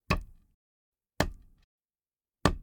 walking stick slow

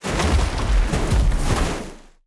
snowfall

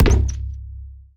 skill pop

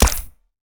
p atk healpistol

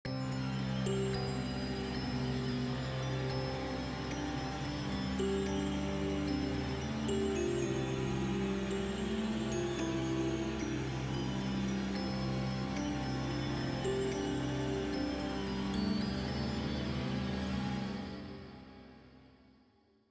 skill trill melody

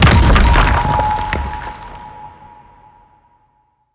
flag land

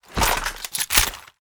gun equip

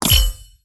violin

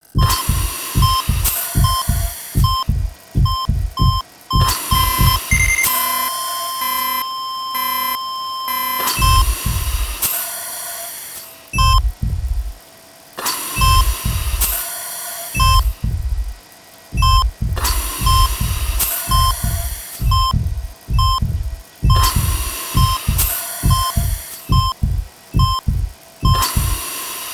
ekg loop

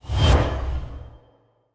flash powerful

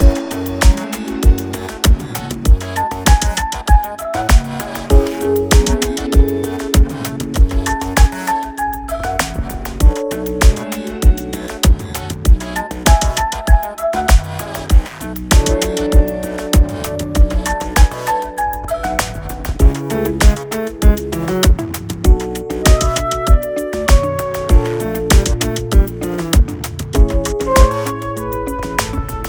holiday loop

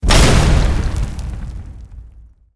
mecha down

